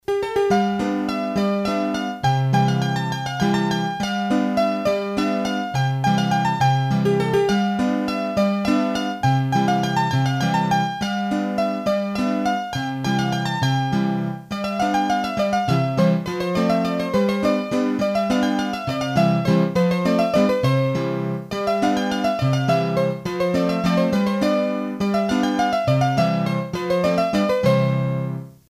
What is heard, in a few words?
La Bourree de Maurienges, hurdy-gurdy